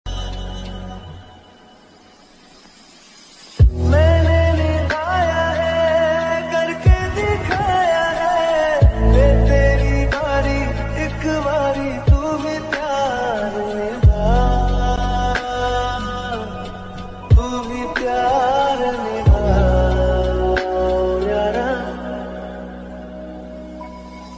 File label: Best Emotional Sad Song Ringtone